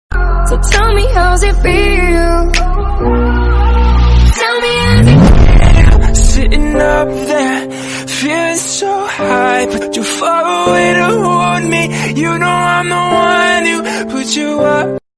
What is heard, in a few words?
transformation music; tik tok